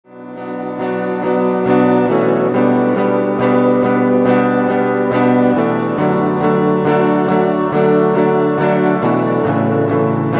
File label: tremolo